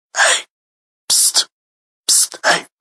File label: psst